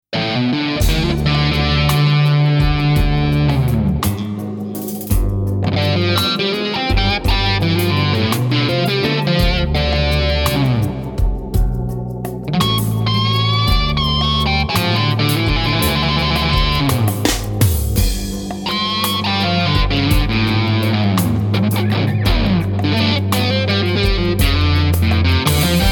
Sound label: Blues Dist